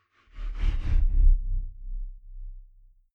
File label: sci-fi vehicle drive by metal wobble slow; raumschiff